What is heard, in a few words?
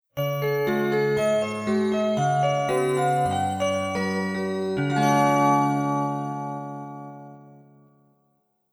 chime; johetsu